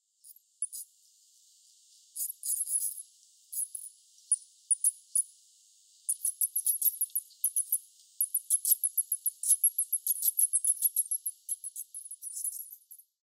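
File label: hinakomori, Asian Parti-coloured Bat; wakayama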